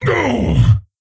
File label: Wound; King Ymiron